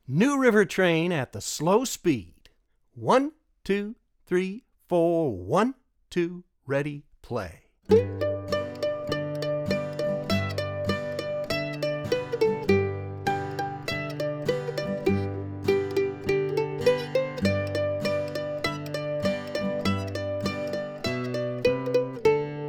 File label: New River Train SLO